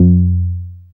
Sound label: Bass SH-101